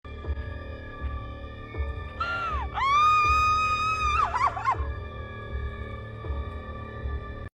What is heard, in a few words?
crying Meme Sound Effect; Euphoria Cassie